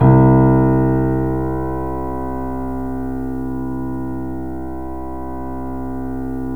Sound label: C, The Piano; InVision Interactive